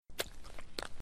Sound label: footstep; inky